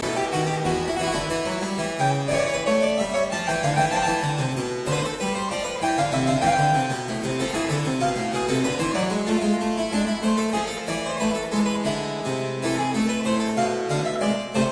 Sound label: French Suite No 3 in B minor BWV 814 IV Anglaise